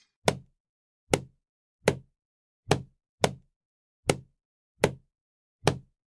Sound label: Club; چماق